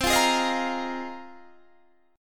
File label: A sharp 7th Suspended 2nd Suspended 4th